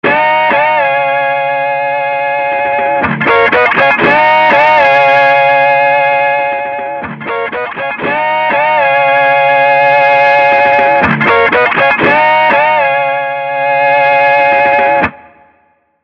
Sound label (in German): E Gitarre Width And Heat